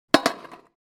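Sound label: Skateboarding landing on a steel grind rail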